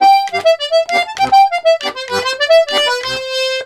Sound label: BOUREE, Accordions; USB Soundscan vol.40; AKAI